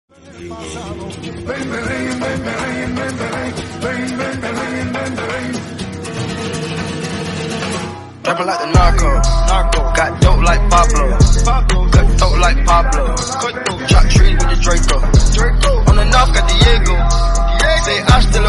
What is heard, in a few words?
remix, punjabi